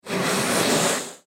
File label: Canoe Drag Scrape Dirt; Transportation Watercraft